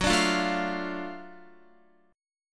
F sharp Minor Major 7th Sharp 5th F sharp down Guitar; strummed